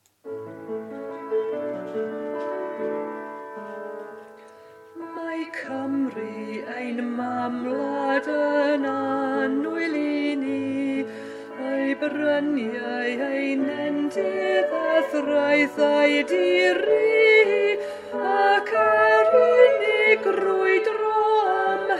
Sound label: duet, alto part